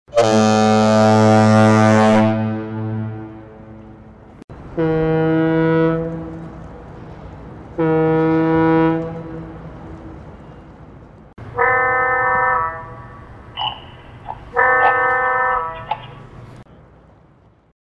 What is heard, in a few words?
Foghorns low mid high